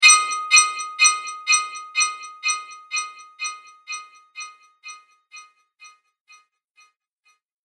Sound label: Bright Magic Sparkle Delay Sound; Fantasy Sound Effect; Magic Sound Effects; Andrew Fleming